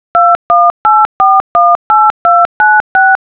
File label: touchtone